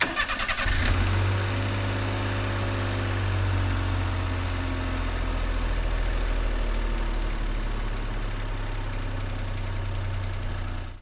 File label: car engine